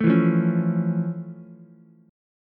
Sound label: F Augmented Major 7th F Ukulele; F+M7; strummed